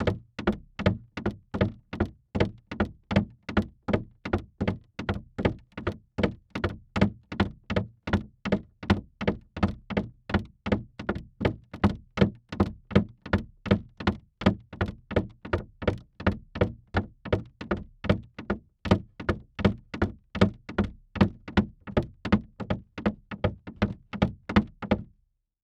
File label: Foley Footstep Hard Sole Dress Shoe Running On Wood Platform; Foley Footsteps